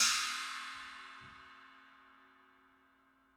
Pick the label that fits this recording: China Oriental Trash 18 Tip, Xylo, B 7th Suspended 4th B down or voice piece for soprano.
China Oriental Trash 18 Tip